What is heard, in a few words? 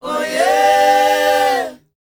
O YEAH, S. AFRICAN, World Voices; ILIO; Vocal Planet